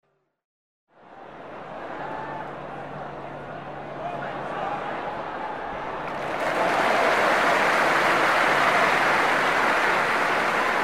zvuka futbol

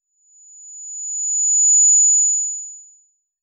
fibdjek

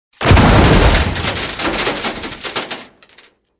mashina upala na asfalt

